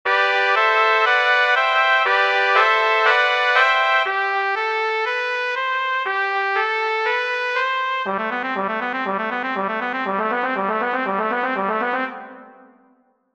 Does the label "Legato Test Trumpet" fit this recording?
Yes